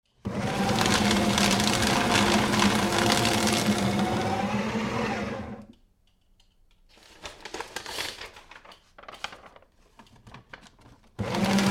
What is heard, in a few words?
Paper Shredder, Electric - shredding various types of paper; Miscellaneous Office; Tom Hutchings